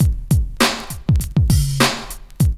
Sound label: LOOP; E-Lab; X Static Goldmine 2